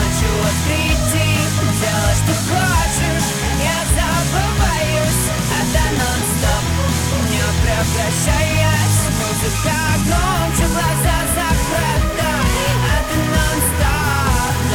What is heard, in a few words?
Muzika Gromche Loop